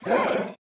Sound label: Damage